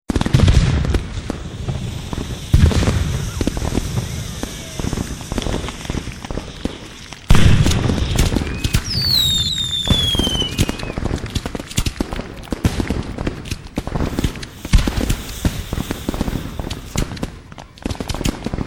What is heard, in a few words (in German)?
Silvester Feuerwerk; ein frohes Neues Jahr 2006